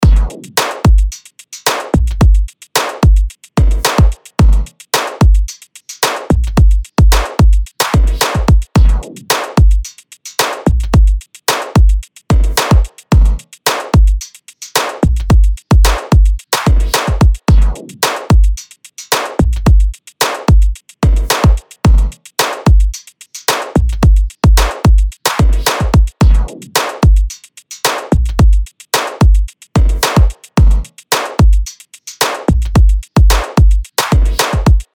DRUM LOOP RETRO POP 110BPM

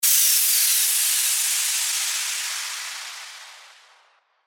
IMPACT

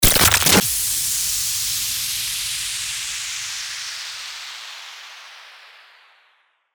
STARTER WHOOSH

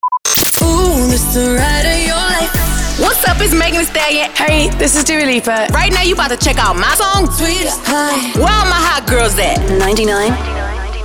POWER INTRO 124BPM; SWEEPER

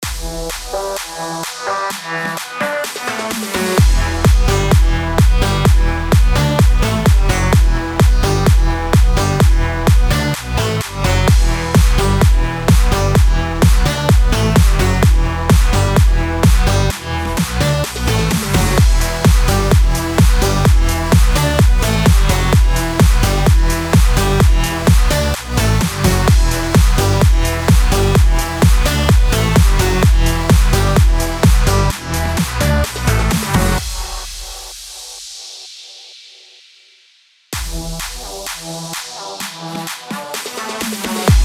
BED ELECTRO HOUSE EMIN 128BPM

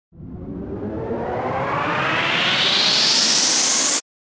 RISER

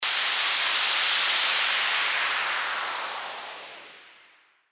WHOOSH

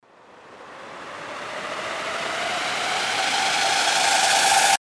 RISER

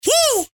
"WOO"

